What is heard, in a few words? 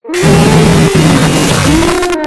Jumpscares shadow; Five Nights at Ronald's